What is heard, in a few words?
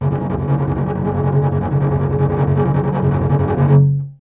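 string contrabass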